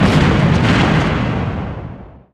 fleet unit destroyed; Stellaris

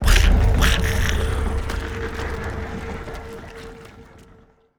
lithoid spider; lithoid portrait; Stellaris

